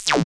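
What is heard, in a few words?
FX synth; Sound & Vision - Gigapack II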